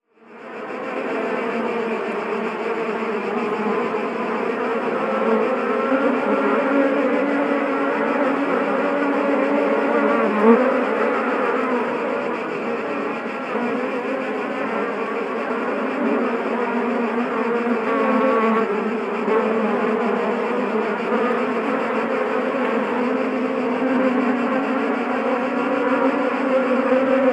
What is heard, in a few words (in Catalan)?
Insectes, Abelles treballant; Son Torrella